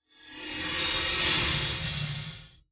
Metal Scrape, Feedback, Reverb, Production Element, Imaging Element, Accent, Transition; Warped